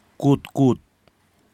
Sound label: birds Pacific robin; Kwamera